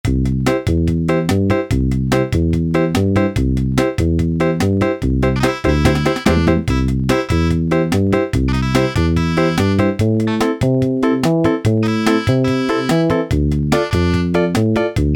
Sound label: ROCK'N'ROLL, Yamaha SHS-10; Factory style demos